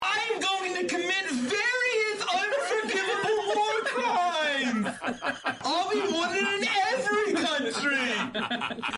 war cry; chuckles

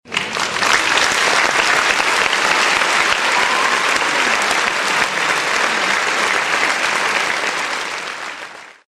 crowd clap long

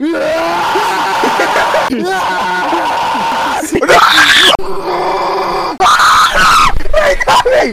screaming; sapnap

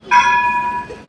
tram bell

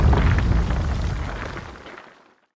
earthquake end

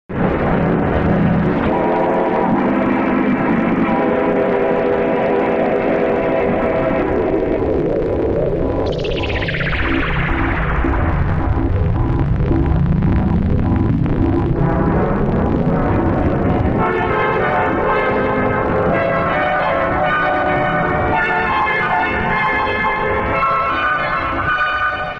video logo scary; carrey video logo

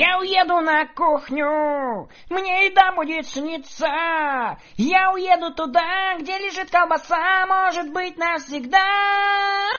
cat; russian

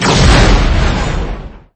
republic gunship death; lego star wars

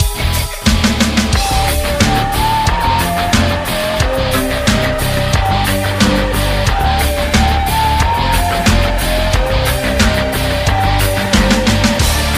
flute; kreuzzug

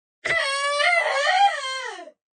bahahahahayahajsudish

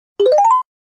animal crossing complete

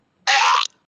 goblin got killed

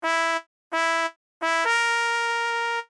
brass; tf2

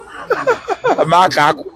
hehehe

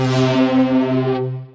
diesel horn

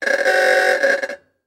ahooga horn sound; peppa pig

